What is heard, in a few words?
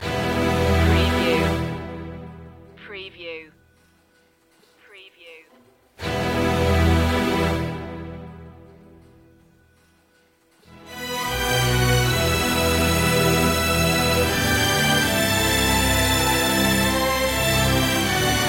strings only